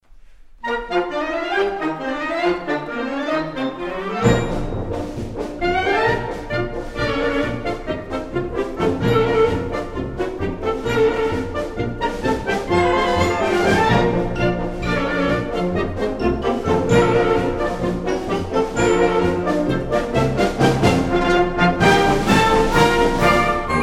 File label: Polka